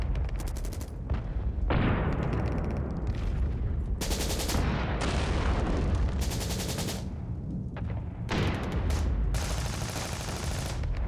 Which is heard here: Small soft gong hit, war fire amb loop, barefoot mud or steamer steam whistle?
war fire amb loop